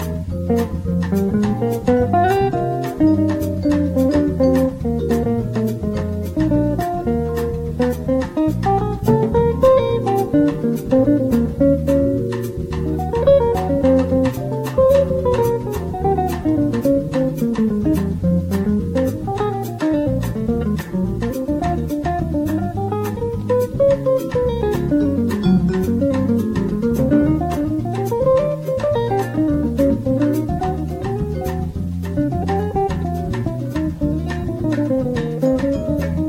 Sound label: Solo